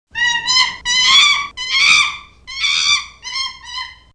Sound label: sound monkey